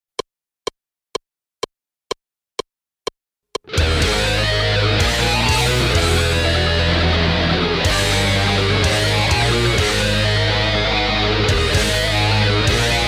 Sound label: WAH